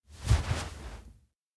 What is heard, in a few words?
kigurumi lookdown body; awayuki; anemoi パッケージ版 1.1